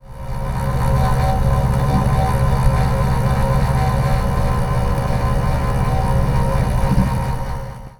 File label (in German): Klangschalen